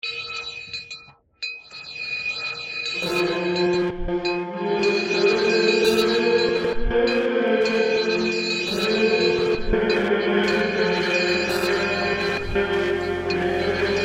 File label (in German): musik